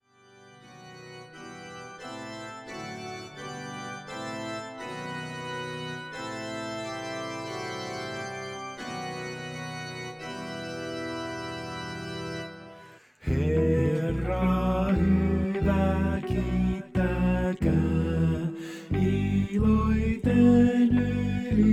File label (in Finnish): Laulu Piano Basso